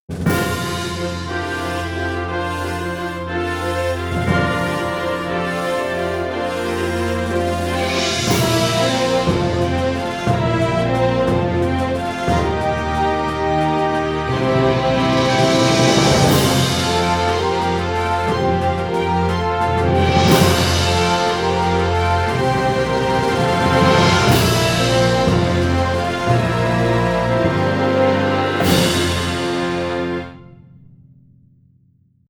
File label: Fanfare